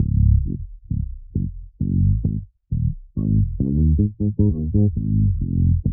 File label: BASS; multitracks